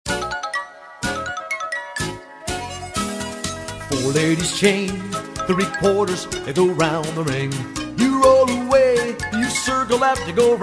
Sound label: vocal